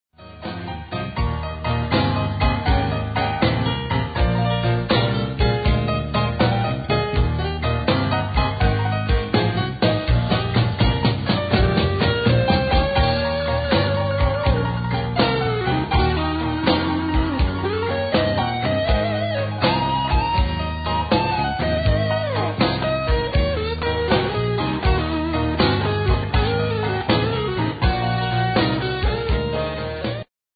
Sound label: music; directional sound